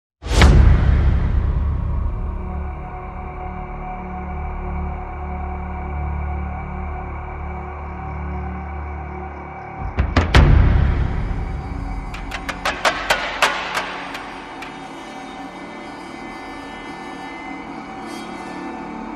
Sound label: No Woodwinds